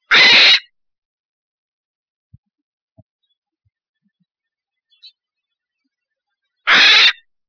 brown falcon

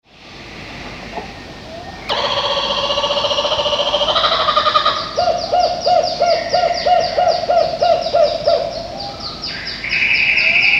dawn chorus; Chambers Lodge; Bruce Marcot

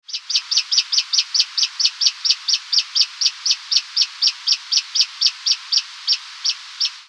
call indiv vswift

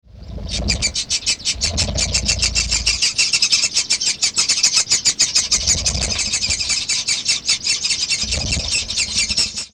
group calls wings vswift

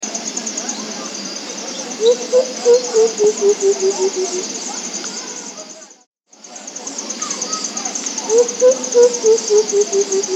Senegal coucal

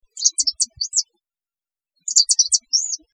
indiv song vswift

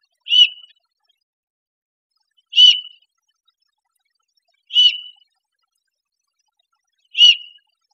puku

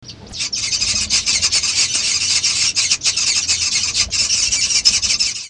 call group; vswift

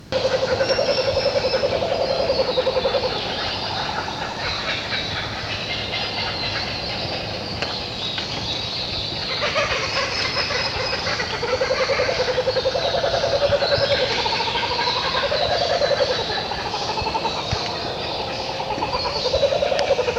laughing kookaburra group; Bruce Marcot

